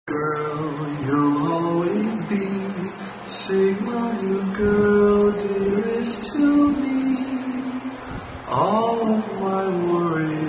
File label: Sweetheart Song Fraternity Song; Sigma Nu Girl